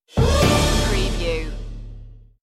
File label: newscast music sting